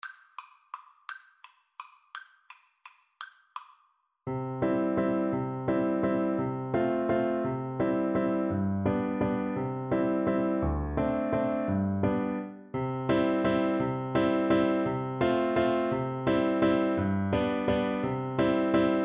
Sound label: French Horn; Schubert, Franz - Cotillon D.976